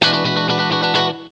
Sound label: classicfunk, guitarra; loops; sampleswap